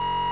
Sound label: Sine